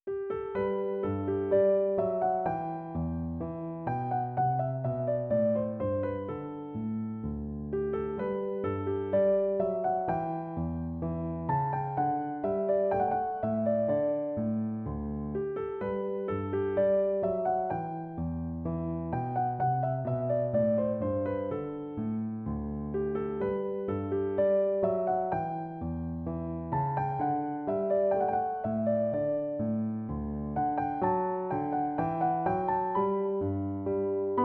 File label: BackingTrack Piano